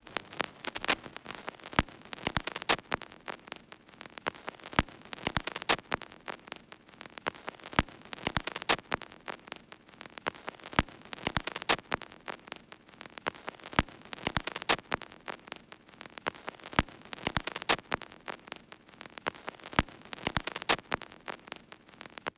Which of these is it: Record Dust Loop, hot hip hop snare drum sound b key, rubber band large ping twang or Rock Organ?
Record Dust Loop